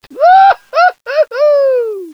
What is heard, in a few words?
"woohoo"